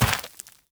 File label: land ground